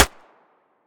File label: hpsa mono distant